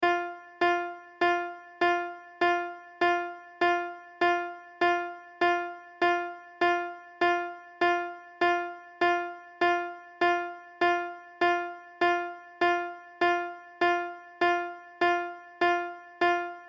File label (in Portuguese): amostra de pulsos com periodicidade